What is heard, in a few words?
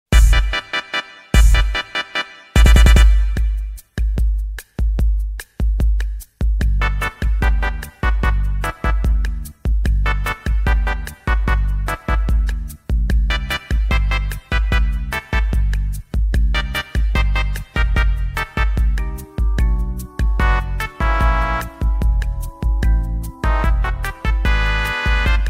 wii shop channel music sound effect